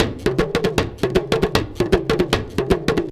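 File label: big tama groove; Best Service; World Colours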